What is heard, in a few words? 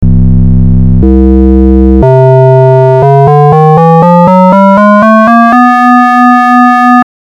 logFM